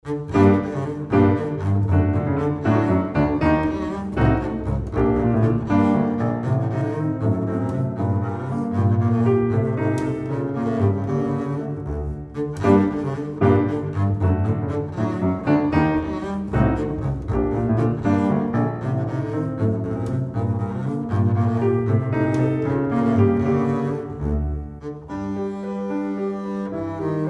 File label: Allegro solo